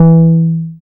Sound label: BAS MKS-50, Roland